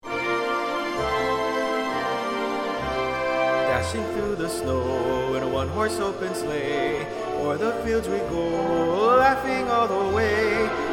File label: Christmas Bells Tenor